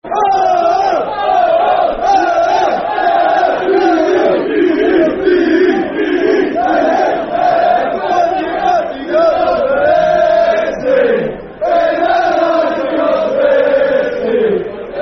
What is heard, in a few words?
The Best Team In The Land, Football Chants; Wolves; wolverhampton wanderers; fanchants